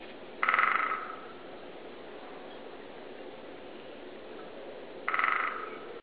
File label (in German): Buntspecht Klopfen